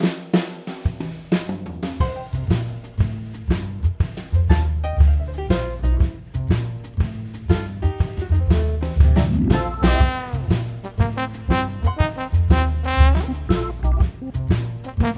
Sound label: trombone